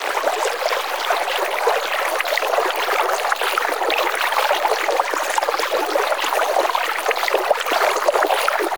water

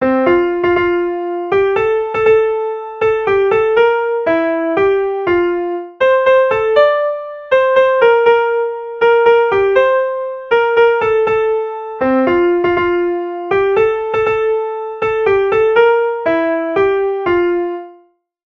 christmas tree; stendhal

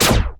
laser small; ufoai; weapons

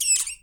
rat squeak

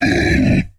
hurt, aliens; ortnok

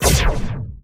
plasma hover heavy; ufoai; weapons

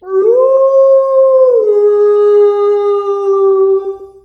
werewolf howl

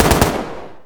bullet rifle; ufoai; weapons

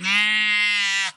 lamb

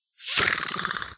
arrow hit

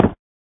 hit ground, footsteps

